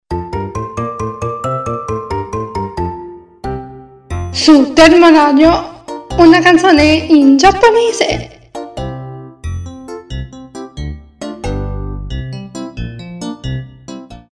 Canzone in giapponese

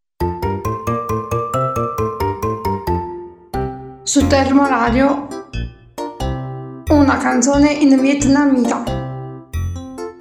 Canzone in vietnamita

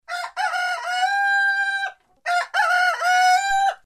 gallo; animaliFX

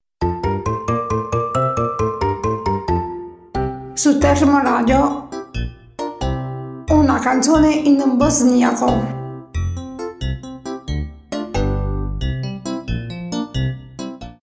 Canzone in bosniaco

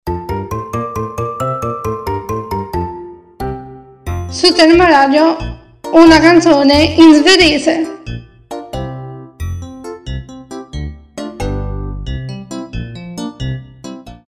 Canzone in svedese

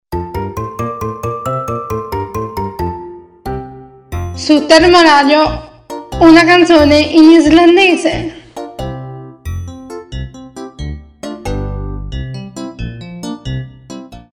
Canzone in islandese

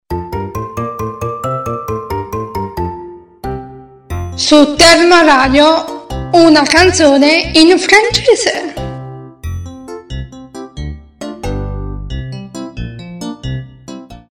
Canzone in francese

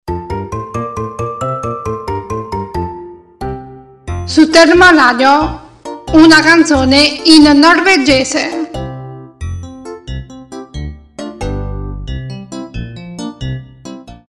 Canzone in norvegese

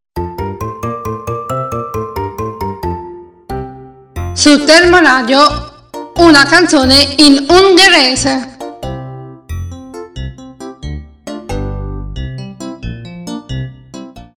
Canzone in ungherese

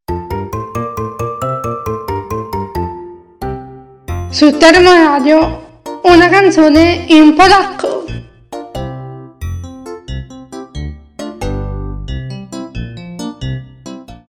Canzone in polacco

